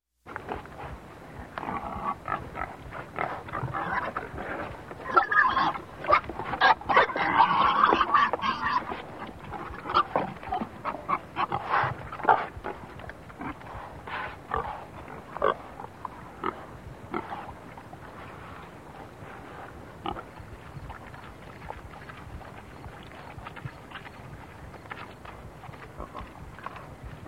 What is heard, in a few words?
Hogs grunting and squealing